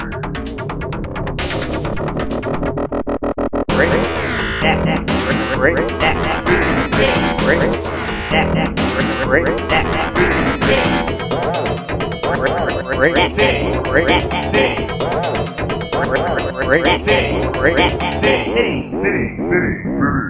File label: Title Screen; breakneck city; ps4 ps5 switch windows xbox one xbox series xs